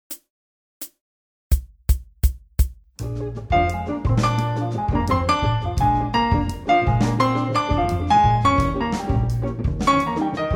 piano blues salsa; transcribing solos

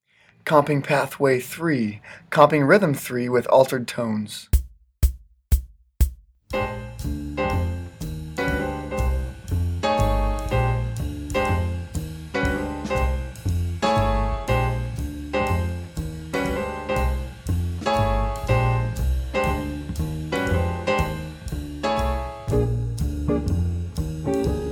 comping rhythm with alterations

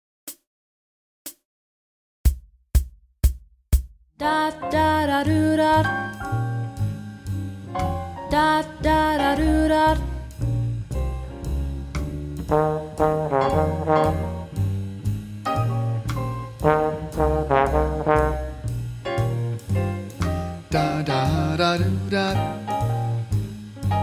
iv chord 4 notes; patterns level 6

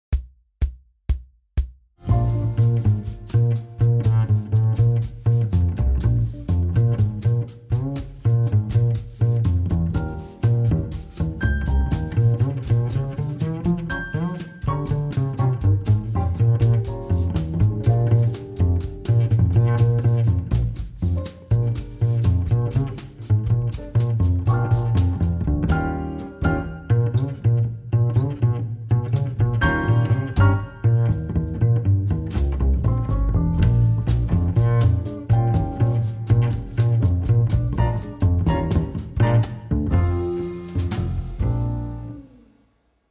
bass I and IV bossa; transcribing solos

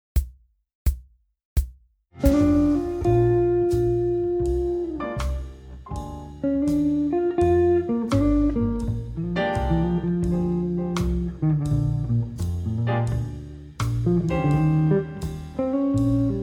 guitar i and iv swing; transcribing solos

